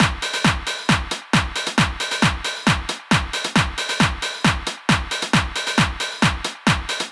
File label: Dance kit 135 bpm